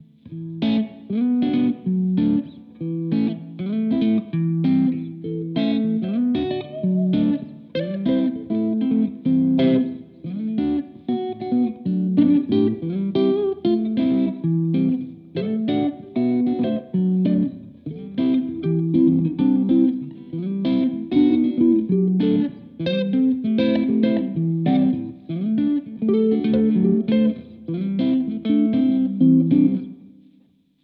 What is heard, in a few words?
D'Angelico Bedford Deluxe Clean Mid Coil